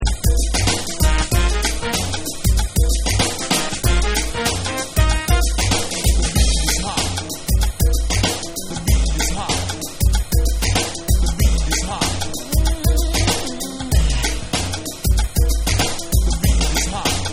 Hip Hot Beat

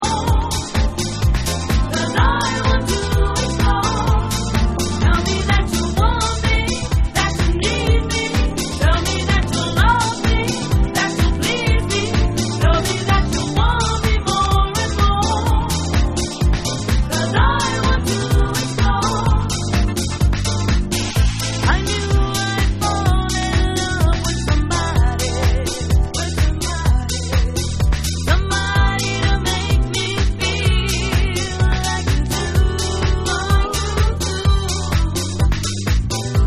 Dub Vocal

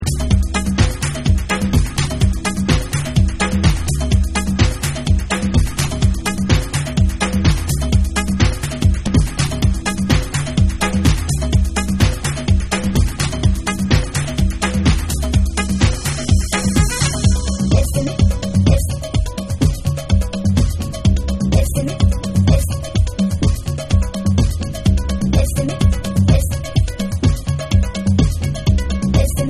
RHODES

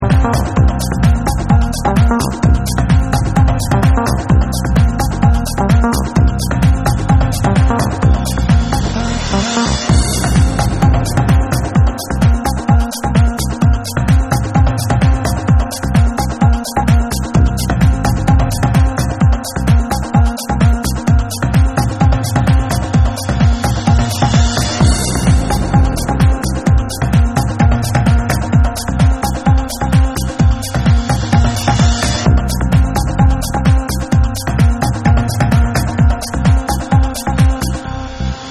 Dub

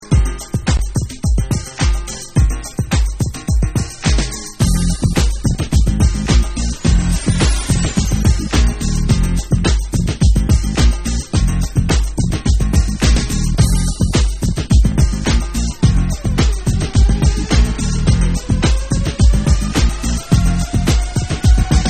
Piano Dub

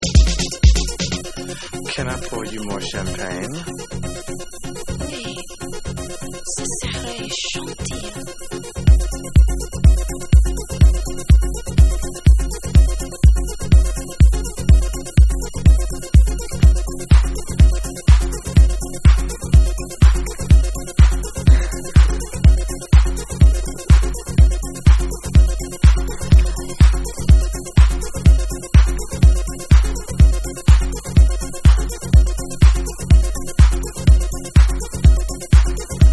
Conversational Mix

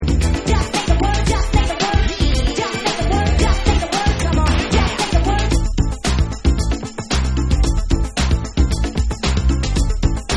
Instrumental

